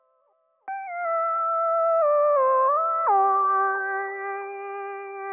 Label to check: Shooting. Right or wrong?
Wrong